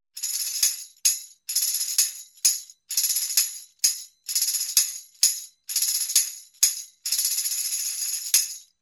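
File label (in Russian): музыкальный ритм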